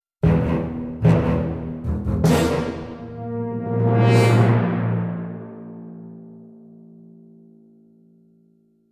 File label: Stinger Enemy; Costume Quest 2